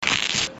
shimaru

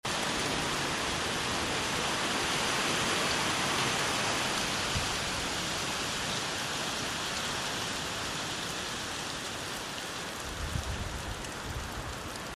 kaze

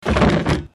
hikidasi

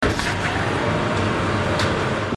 densya

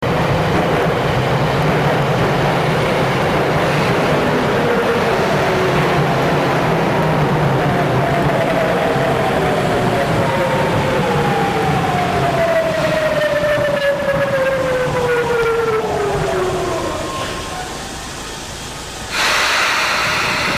densya

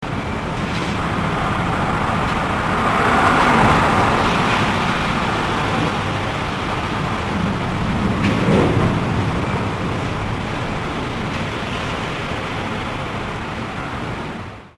hubuki

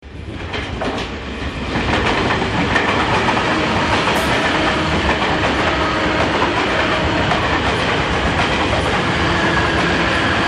densya

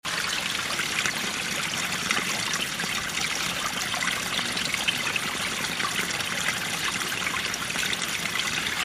wakimizu

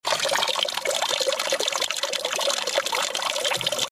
ryuusui

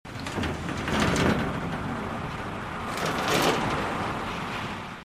hubuki

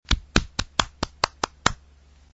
hakusyu

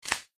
hasami

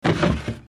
hikidasi

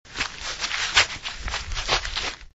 kusa

